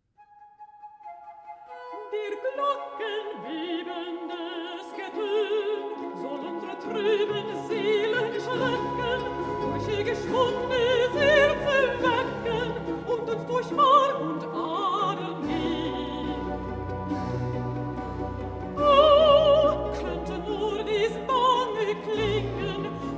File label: Recitative; Der Glocken Bebendes Geton; Bach